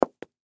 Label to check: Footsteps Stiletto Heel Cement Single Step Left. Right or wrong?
Right